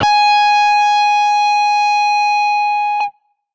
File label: guitar electronic, distortion guitar